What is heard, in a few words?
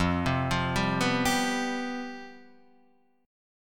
C Major 7th Suspended 4th, F, guitar chord